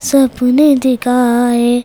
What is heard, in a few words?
CHILD VX C, Indian Traditions; USB Soundscan vol.45; AKAI